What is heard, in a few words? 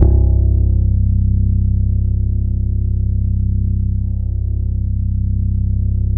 C1, SynthLine; 90 s Sample CDs; NiceBeats; SynthLine VOL-33